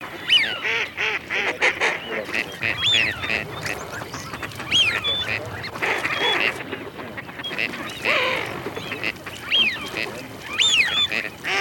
Серая утка Canapiglia + Свиязь Fischione; plurifon

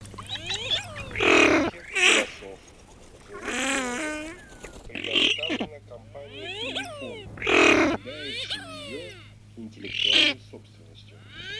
Красноголовый нырок Moriglione; plurifon

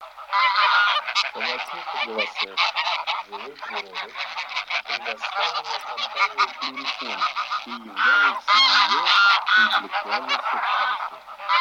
Гусь серый базар Oche Selvatiche

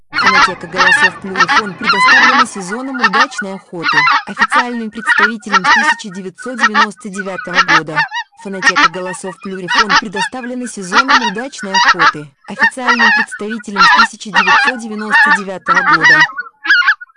белолобый; plurifon

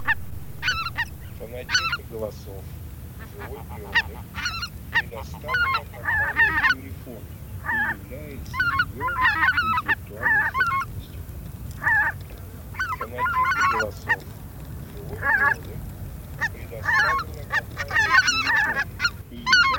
Белолобый базар Oche Lombardelle

